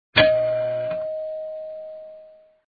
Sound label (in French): Sonnette